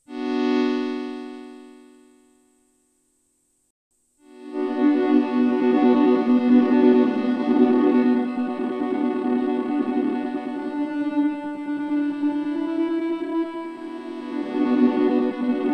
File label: Microfreak Freeze und Glitch Size Error Bitcrush; Chase Bliss Onward